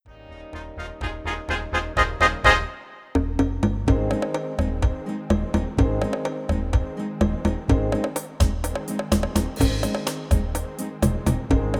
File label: bongo cha cha; songpakete demo